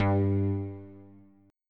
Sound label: ROTATE